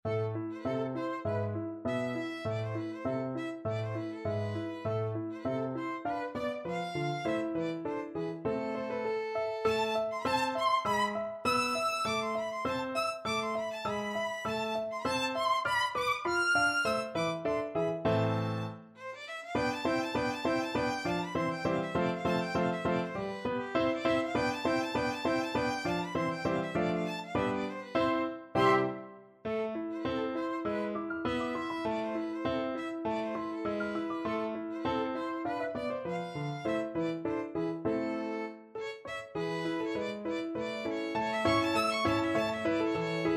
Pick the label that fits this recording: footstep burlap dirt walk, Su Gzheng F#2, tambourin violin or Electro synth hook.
tambourin violin